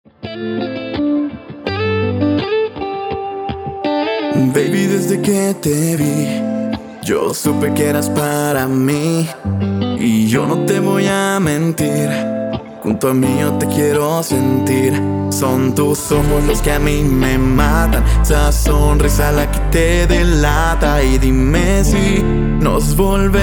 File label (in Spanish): Pop Latino